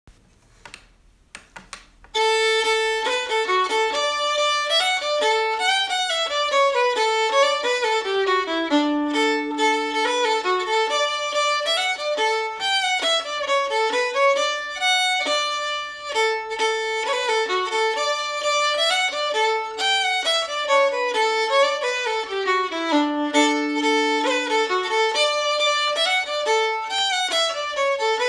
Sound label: polka